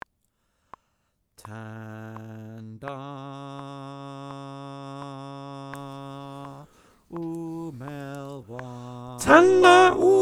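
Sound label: alto, choir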